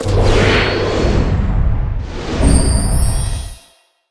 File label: magic mastery